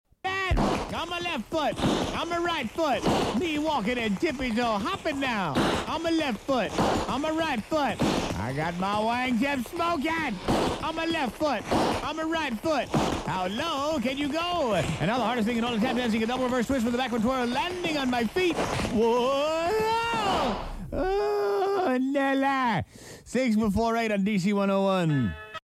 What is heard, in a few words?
Tap Dance; Greaseman